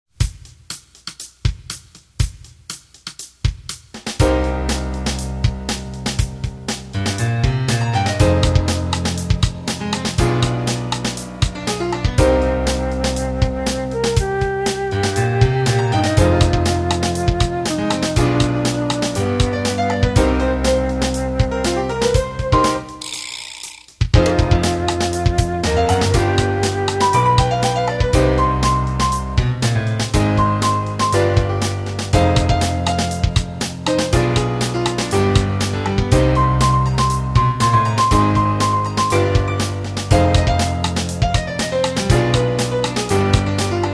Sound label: jazzy